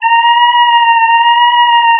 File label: distort; distort samples